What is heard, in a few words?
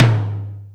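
Amb Md Clear, Alesis D4 drum machine tom; 300 Drum Machines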